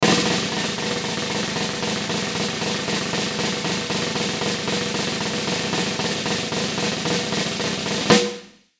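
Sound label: Musical Element, Drum Snare March; Blastwave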